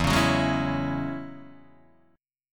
E 6th Add 9th E down Guitar Open D

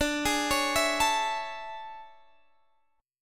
A Major 7th D; AM7/D; arpeggiated

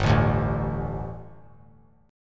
G Suspended 2nd G, down; Gsus2 strummed; JGuitar

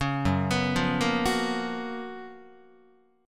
F sharp Major 11th Guitar; F#M11 Chord; arpeggiated; 4,2,2,3,2,5

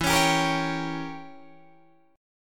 E Diminished 7th E down Guitar Open D

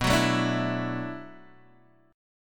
B Minor 11th B down Guitar Open D

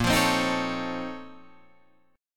A sharp Minor Major 11th, Guitar, Drop D

